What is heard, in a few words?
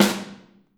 Snare OH; Snare OH All; Drums Percussion